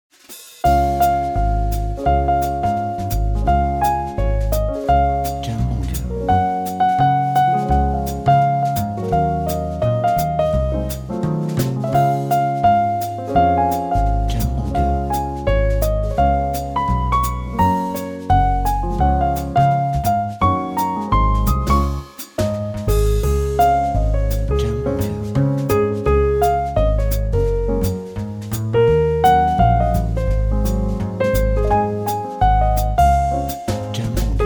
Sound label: Jazz Jingle Bells